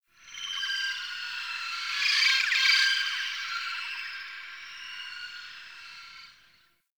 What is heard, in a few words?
Distant Egg Cries; Warframe; Tau